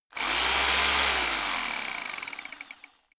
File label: rock drill chisel burst short; schrauben drehen hydraulik